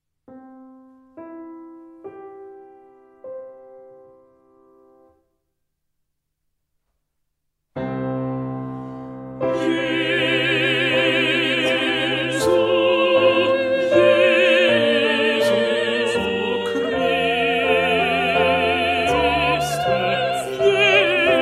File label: jesu christe tenor